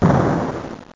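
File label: explosion underwater; worms dc; tykes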